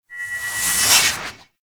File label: Melee Heavy Attack Charge, Whooshes; Warframe; Weapons; Heavy Attacks